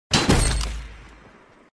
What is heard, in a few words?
headshot sound; Fortnite